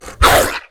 werewolf vocal bark; Monster Within; soundmorph

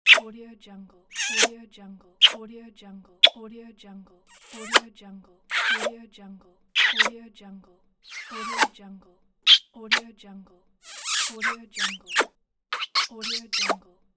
kiss

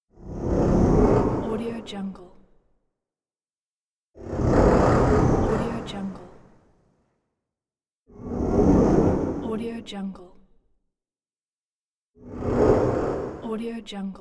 hollow space flybys; lokohighman

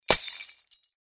bulb glass break smash; tibasfx

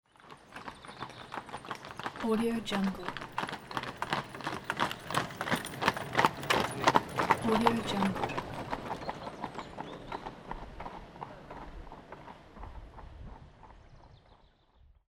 horse carriage; n-audio

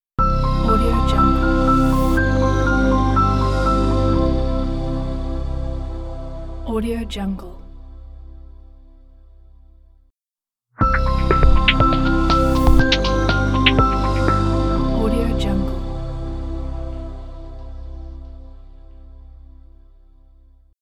piano logo

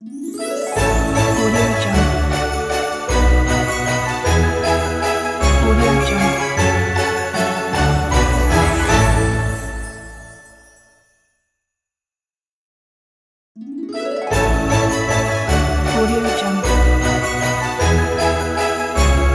we wish you a merry christmas logo

